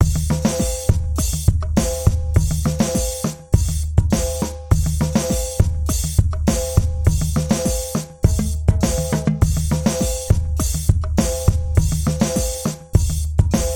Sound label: loops drum pattern